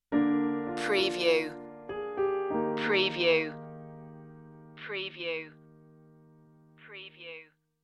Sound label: falling leaves stinger